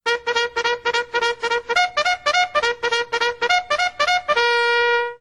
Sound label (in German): Trompete US Army Weckruf Kavallerie Attacke; trompete klingelton